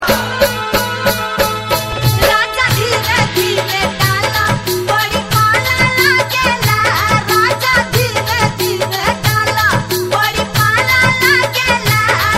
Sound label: holi song